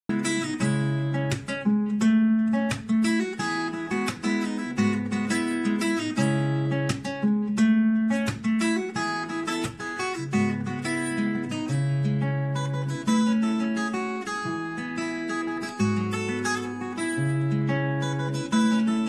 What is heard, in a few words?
fingerstyle acoustic guitar cover; кавер на гитаре